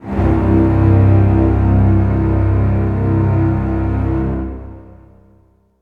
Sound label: Music Logo Sting; Menu FX